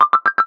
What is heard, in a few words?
alert; IGO; android